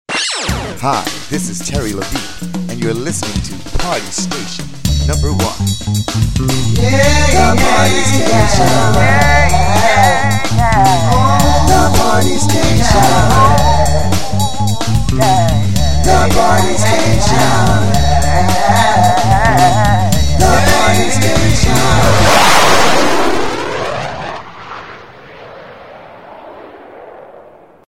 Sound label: Jingle